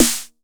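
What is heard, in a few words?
snare, drum machines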